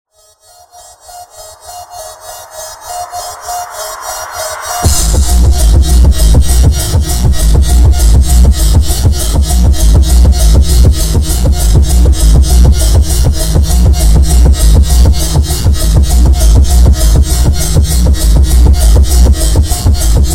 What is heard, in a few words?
House Phonk dance Mix